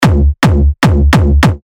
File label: Kick C#; Bedrock; terra kicks; Revealed Terra Kicks